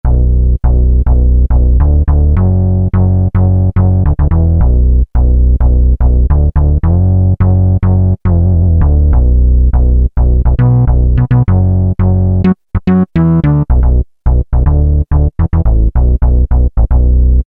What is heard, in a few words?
Deep Bass